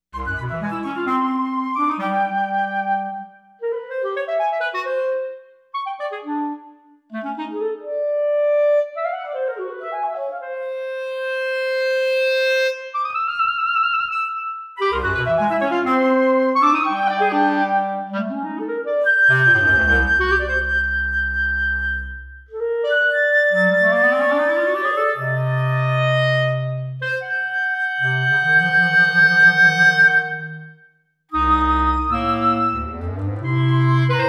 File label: Birds